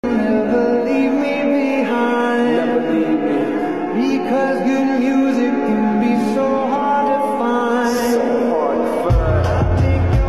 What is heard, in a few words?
Tik Tok trending ringtone